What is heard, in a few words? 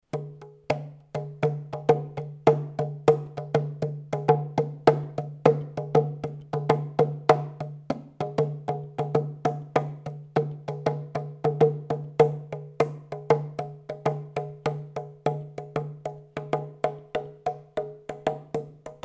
Percussion live